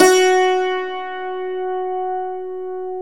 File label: DULCIMER F#3, Stereo Dulcimer